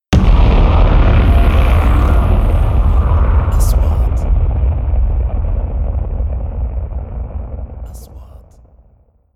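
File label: 3D SFX Trailer; مؤثرات تشويقية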